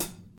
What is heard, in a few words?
light hat sample a sharp key